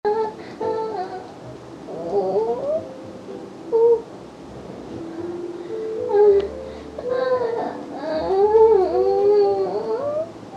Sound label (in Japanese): えち音声